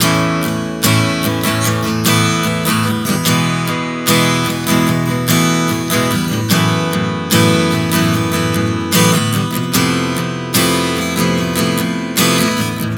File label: Progression 074 Asus9-E-F#m11-F, Acoustic Guitar, Chord Progressions 074 bpm; Sonic Foundry; Songwriter Acoustic Guitar Companion